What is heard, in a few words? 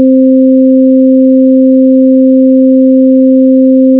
tone sin x+2x